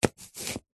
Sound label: apple chop slice with large knife; food